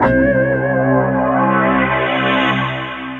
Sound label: Low harmonic; Sonic Foundry (Sony Creative Software); Ugly Remnants VOL-2